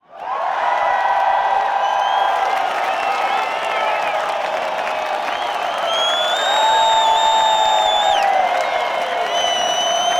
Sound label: Medium Outdoor Golf Gallery Audience Cheering Applauding Whistling; Sound Ideas